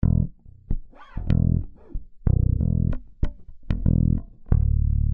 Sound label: Smack Funk Fretless; Funk & Rock; Loopology; Get Dirty